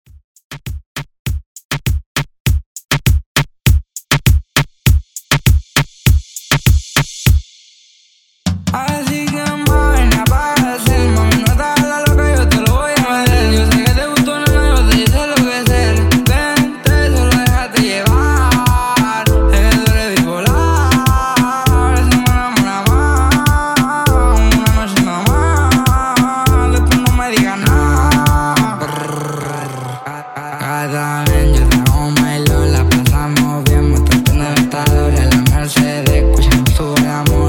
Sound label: Reggaeton Mix